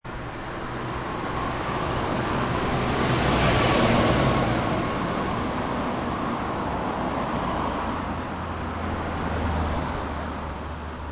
Light Traffic On Main Road; Traffic And Car; Lynne Music

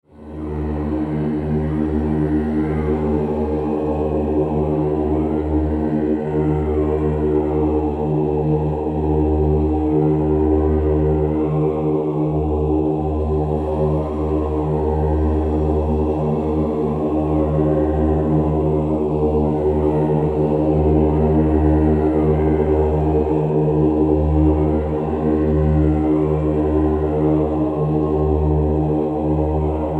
Drone Vocal Monk Chant; Blastwave

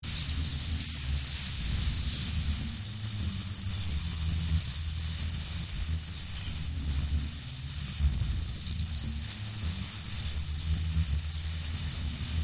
Electric, Discharge, Magnetic, Complex, Scifi

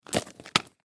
Gas Mask Set Down; Military; Breathing; Human; Warfare

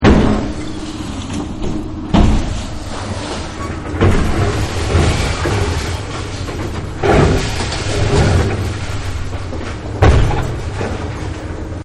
Tearing Down The House, Smashes; Objects

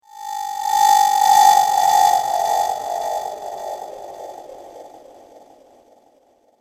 Spectral Ghost Of Death, ghost sound effect; horror sound effect

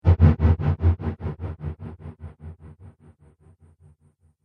Drum Hit Trailer; Hit Sound Effects

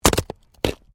WOOD PLANK BREAK

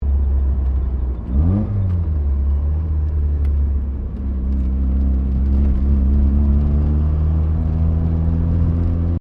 CAR MAZDA RX7 SERIES3 INTERIOR SLOW AND TURN; 1983; Cars (Sound Effects:Vehicles)

